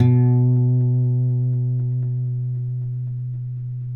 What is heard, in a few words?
Finger B 2 L, Guitars; Spectrasonics; Hans Zimmer Guitars VOL-1